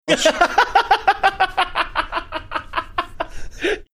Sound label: laughing